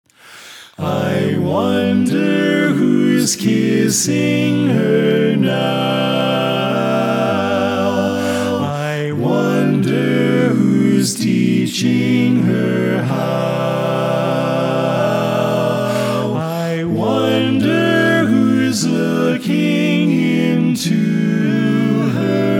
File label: Bass Left